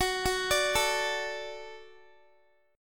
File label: F sharp Minor Sharp 5th F sharp Guitar; F#m#5 arpeggiated